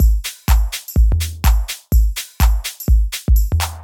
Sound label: deep house drums; Deep House Drums – FL Studio Tutorial